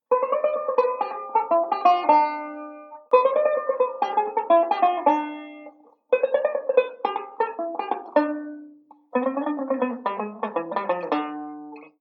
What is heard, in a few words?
1 String Lick, banjo; Beyond Chord Melody Volume II